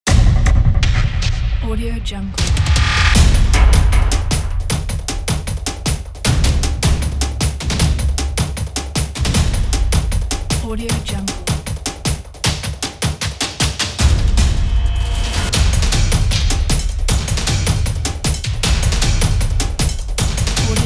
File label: action logo